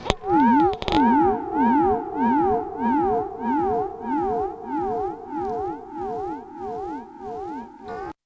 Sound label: SPACEY R, Funk Guitar; Zero G - Funk Guitar